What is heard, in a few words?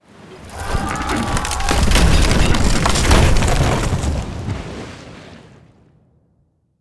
ship ram ship